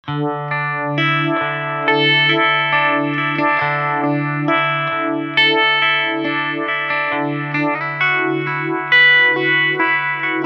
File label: tube vibe; effectrode